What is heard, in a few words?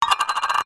household tin shoe polish set down concrete